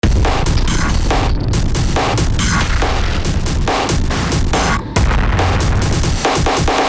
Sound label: kits damage kit; ni heavyocity damage testbericht